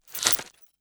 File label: Glitch, noise